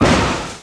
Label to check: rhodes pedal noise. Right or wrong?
Wrong